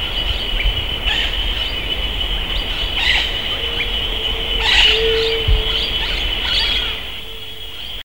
bird

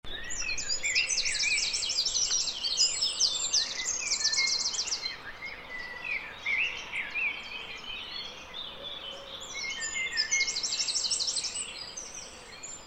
Turdus merula; SETE

